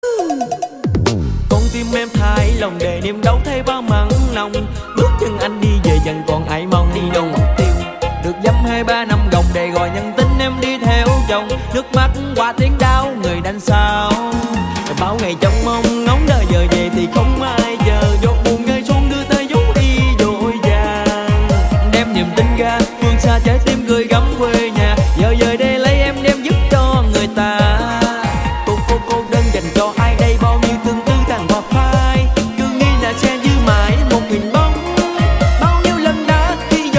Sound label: Chachacha Version